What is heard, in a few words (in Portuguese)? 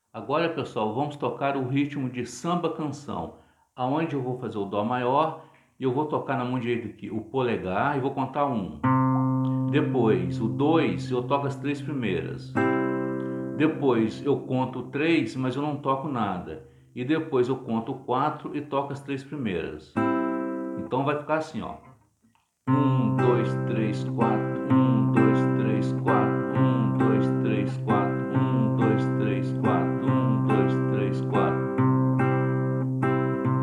Ritmo samba canção